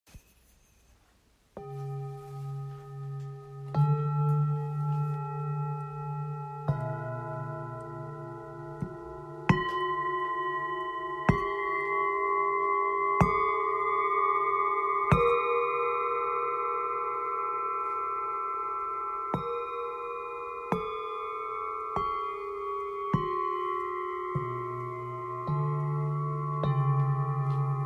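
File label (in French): Set 7 bols, bols chantants tibétains; lumiere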